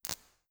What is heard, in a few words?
wraith spark; Stellaris; guardians